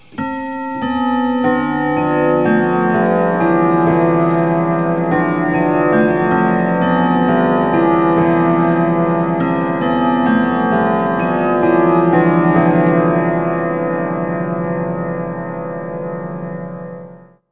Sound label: Whittington 1⁄4 Till, Chimes; KABOOM! - Wild and Wacky Sound Software; Household